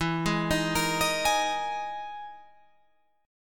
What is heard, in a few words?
E Major 7th Flat 5th E, Guitar, Open F; 16,15,15,17,18,15; arpeggiated